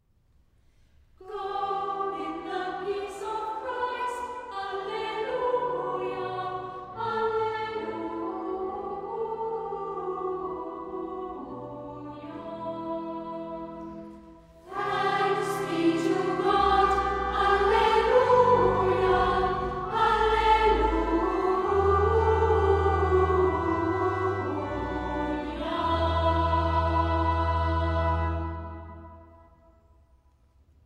Gregorian Chant Ite missa est Deo gratias English version Go in the peace